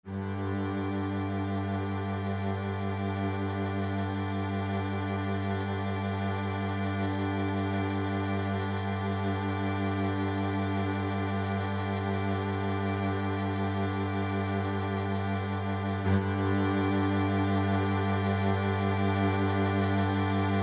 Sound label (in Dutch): Bourdon in g mineur a mineur voor klarinet